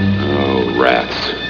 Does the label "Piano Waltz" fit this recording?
No